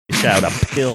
snickering

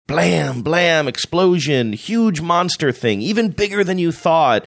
blam blam explosion

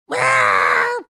making an aggressive meow sound

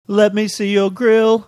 singing let me see your grill

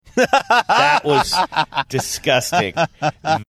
laughing saying that was disgusting

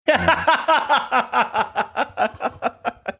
having good laugh

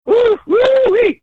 whoo whoo wee

